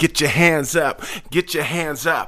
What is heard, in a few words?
Rap Vox